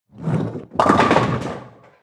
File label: Bowling Knock Over Pins